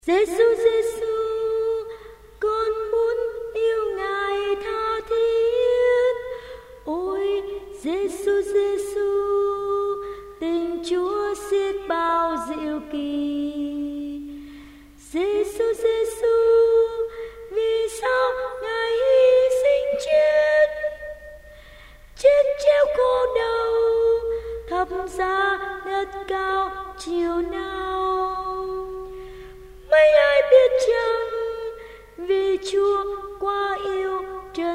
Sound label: tenor